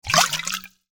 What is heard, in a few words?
Bottle Glass Whiskey Jug Shake Lid Off